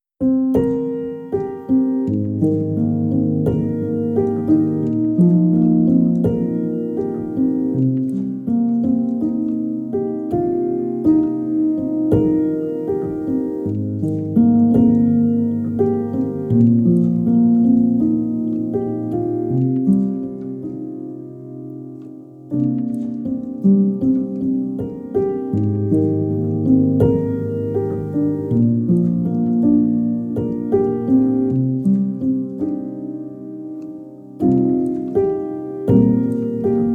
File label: Piano